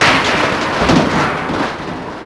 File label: destroy debris; WAR